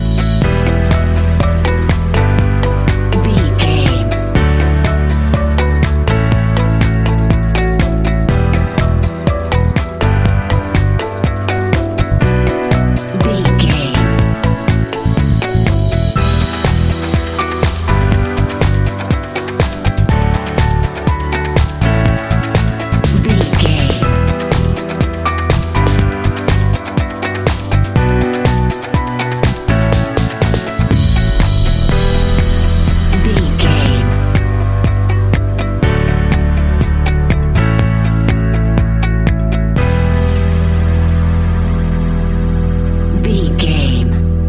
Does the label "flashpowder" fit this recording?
No